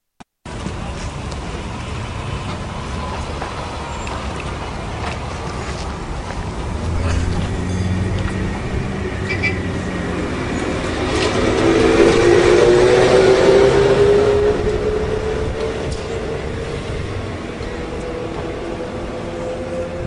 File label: portsmouth trolleybus